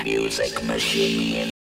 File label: music machine